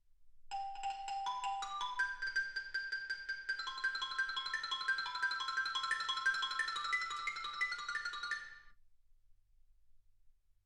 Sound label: xylophone